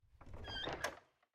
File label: Closing window